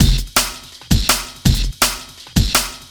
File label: dancepack beat